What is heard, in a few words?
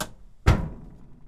Big Thump, toolbox rummaging